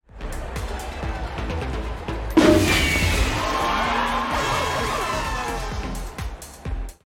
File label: Stinger Music